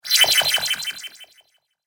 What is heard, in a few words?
Cartoon Magic Spell